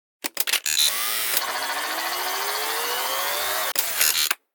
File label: module move, ships